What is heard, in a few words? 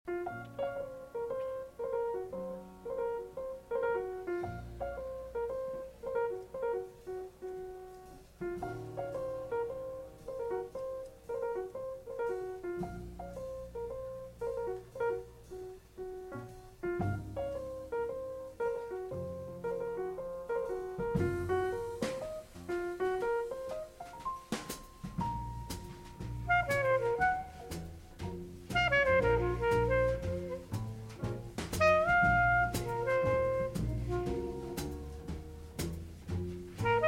Koto Song